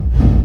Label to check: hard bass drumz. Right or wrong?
Right